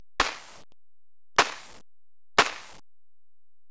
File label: GUN